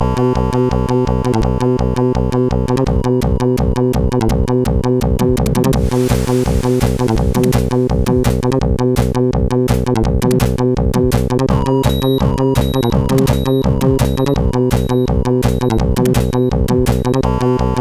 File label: Scream, sid CSG8580R5; hvsc